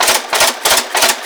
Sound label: Shipyards Conveyor Loop; Ambience; Props; Warframe; Grineer Shipyards